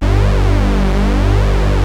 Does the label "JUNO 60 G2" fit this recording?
Yes